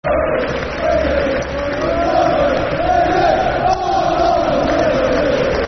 We Love You Rovers, Football Chants (Soccer Songs); Blackburn Rovers Brfc